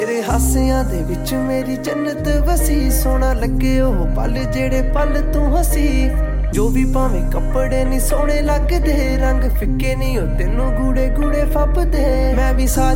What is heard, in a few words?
Punjabi Sad Song Ringtone